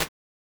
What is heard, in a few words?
Bedroom; Ambience and Small Rooms; Ableton; Convolution Reverb